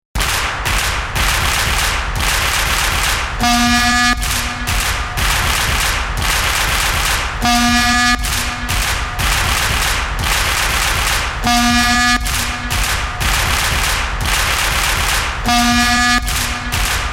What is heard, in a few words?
Sports Clap, Rhythmic sports fans clap with horn loop.; Miscellaneous Sports